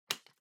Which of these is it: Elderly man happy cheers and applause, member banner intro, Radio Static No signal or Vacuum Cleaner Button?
Vacuum Cleaner Button